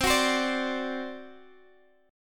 C Suspended 2nd Flat 5th C Guitar Open F; strummed; Csus2b5; x,19,18,19,17,x

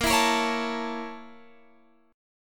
Bb Minor Major 7th Flat 5th, Guitar, Open F; BbmM7b5; strummed; x,17,16,17,16,16; JGuitar

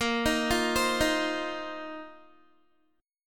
Bb Suspended 2nd Suspended 4th, Guitar; Bbsus2sus4 arpeggiated

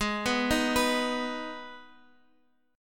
Ab Minor Ab, Guitar; arpeggiated; 16,14,13,16,x,x; down; 4E,4A,5D,5G,5C,6F

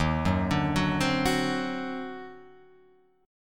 Eb Minor Major 13th, Guitar, Open F; EbmM13; arpeggiated; JGuitar

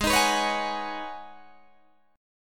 Ab Minor 11th, Ab, Guitar, DADGAD

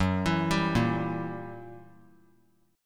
Fsharp 7th Flat 9th down, F#7b9 chord; arpeggiated; JGuitar

